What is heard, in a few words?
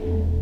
E-1, BOURDON PED, Organ